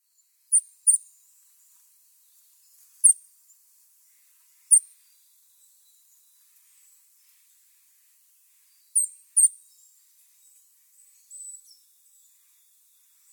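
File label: Eurasian Nuthatch chick; kyoto; koe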